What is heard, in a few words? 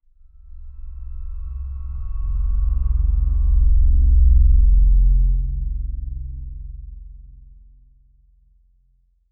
cinematic riser very low, drone